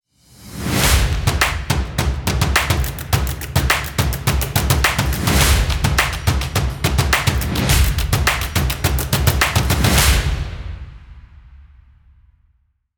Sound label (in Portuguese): logo percussao